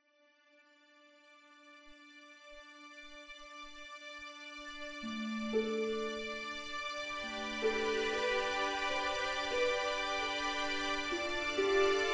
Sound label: Calm Ambient; gamerip; Heavenworld